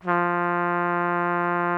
trumpet F2; Greytsounds VOL-1

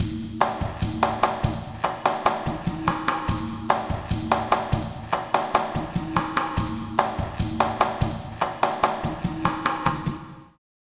Drumz, Lounge 146; Zero-G Kocktail Kollection; Construction Kitz

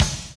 DOUBLE BD; Univers Sons

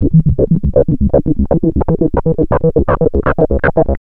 Atonaloop, Industrial Analog

